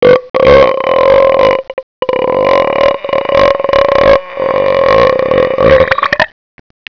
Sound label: vurp